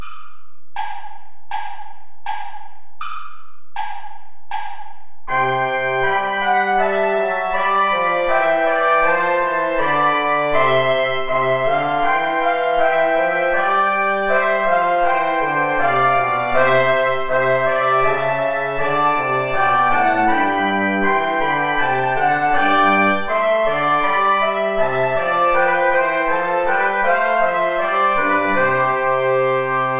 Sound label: play along without trombone